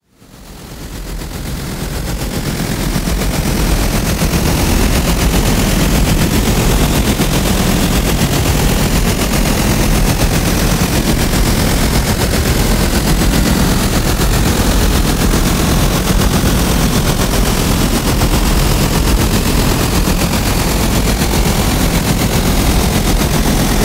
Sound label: X-waves; Dette 7HZ lydmaleri indeholder nogle ingredienser, der øger den meditative effekt.